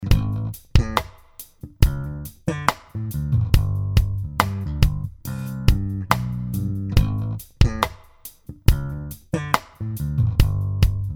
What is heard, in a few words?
Snare